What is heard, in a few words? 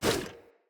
Bow Melee Whoosh; Weapons; Player; Bows; Grand Father Bow